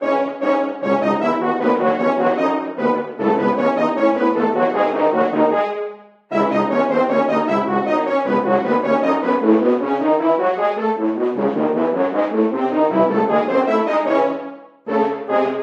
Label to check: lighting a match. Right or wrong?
Wrong